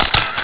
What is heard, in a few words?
camera click